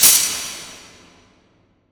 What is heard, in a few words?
designer hi metal hit; SoundsCrate